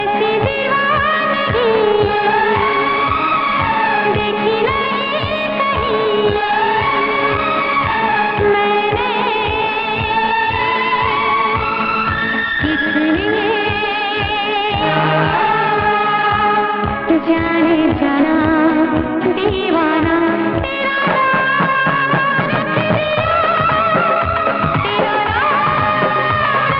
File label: Old Hindi Song